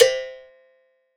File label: Berimbau C3; Guitar & Plucked